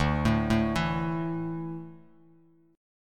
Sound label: D Suspended 2nd D down Bass Standard; arpeggiated